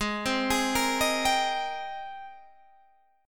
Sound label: Ab Major 9th Ab